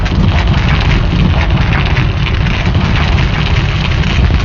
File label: rolling; ice age scrats nutty adventure